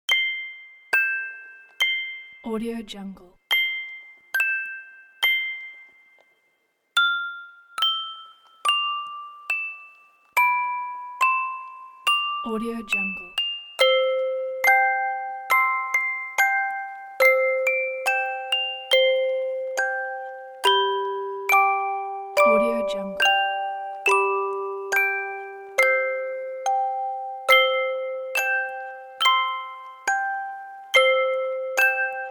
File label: music box lullaby; this old man